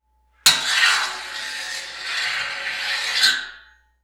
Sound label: Metal; TOOLKIT vol.2